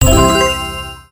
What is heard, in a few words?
collect; Bingo